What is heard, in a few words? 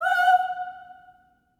Staccato M F#5 L, Symphony of Voices; Spectrasonics